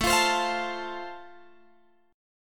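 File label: A Minor Sharp 5th, Guitar Drop D, chord strum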